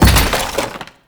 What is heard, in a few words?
Collision Fast, Motorcycle; Warframe; Vehicles; Motorcycle Ninety Nine